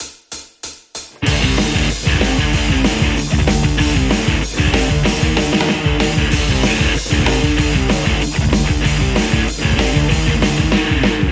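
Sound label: metal Stratocaster; soldano x88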